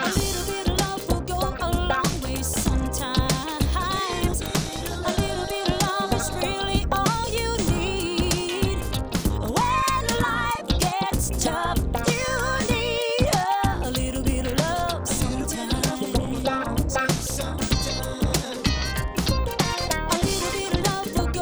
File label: musicmix